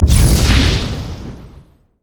summoner promote; LoL

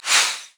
basicattack; LoL; varus

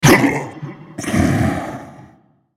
dying; nasusskin05; Character Voices - English

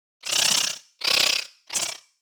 young lizard bored; LoL; monsters

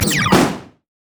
turret; LoL; heimerdinger

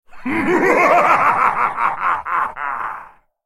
Demonsword laugh; Tryndamere

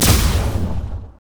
lux finalesfunkeln; LoL

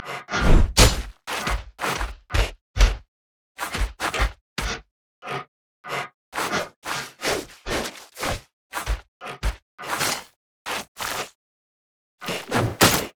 emote dance; nautilus

